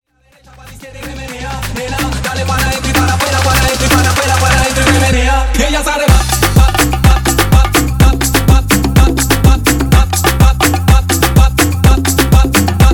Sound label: afro remix 125 bpm